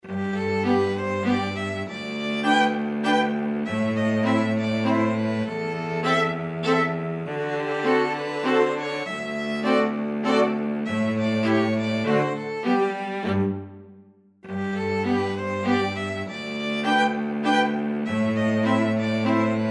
string quartet

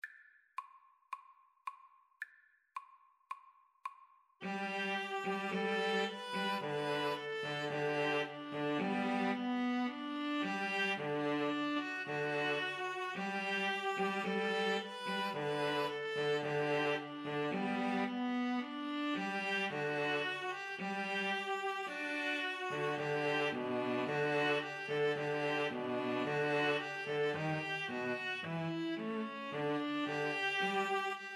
ode to joy, String trio

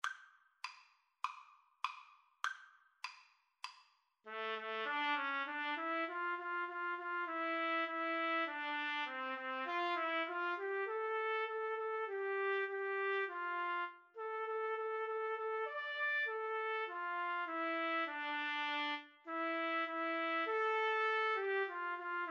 Trumpet Trombone Duet

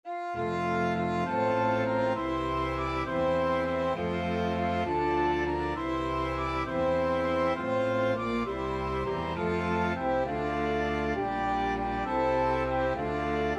flexible mixed ensemble 4 players

